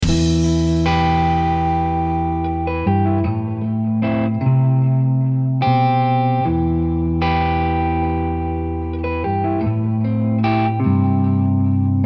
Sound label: Acoustic Rock